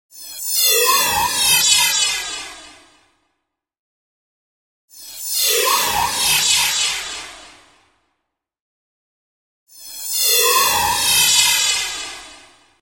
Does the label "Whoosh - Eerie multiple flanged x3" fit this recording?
Yes